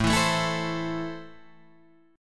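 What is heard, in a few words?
A Minor, Guitar Drop D, chord strum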